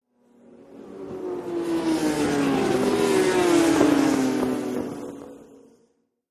Motorcycle Road Racing

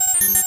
Computer Button Key Swi; communications

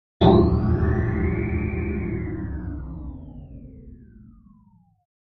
Bell Deep LFE Bell Chime

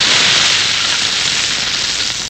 Sizzle Cut Off, cooking

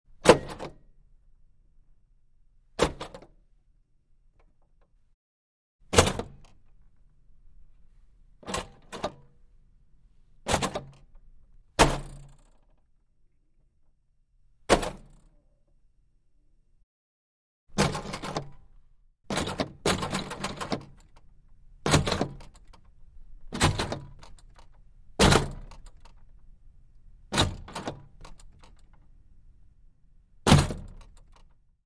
Door Push Rail Wood

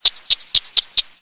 Frog Cricket Chirps

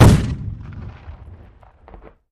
Cannon Fire Single; shots

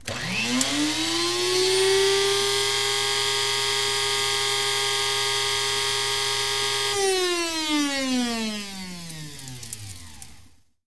Coffee Grinder: Electric Machine Spin.

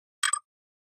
Flash Beep HF Hollow

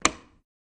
Light Switch Wall Switch; switches

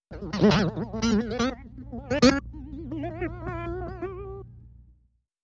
Bing Metallic

